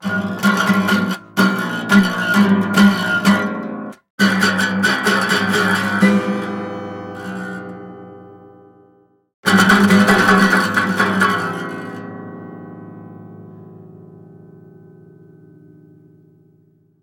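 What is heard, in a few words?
out of tune guitar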